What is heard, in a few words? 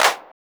claps, techno